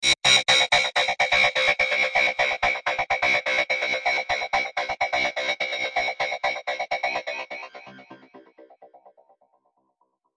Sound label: 126 space banjo